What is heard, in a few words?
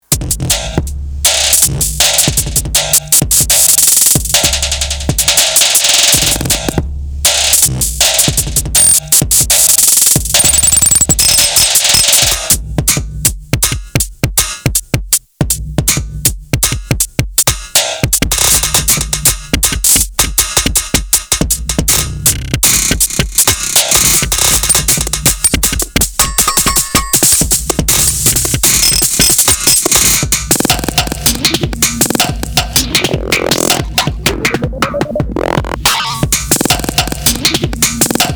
metal beat